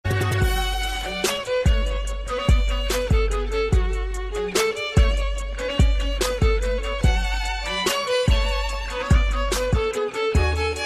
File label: violin and beat ringtone; i phone 7